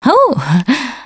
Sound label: positive noise, russian, Survivor Voice Producer